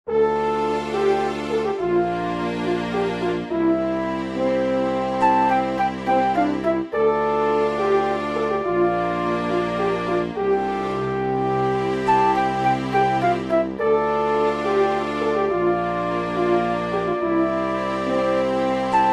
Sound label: Castle Britain, music